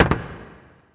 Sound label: step metal amb; SpecOps2 MPlayer Demo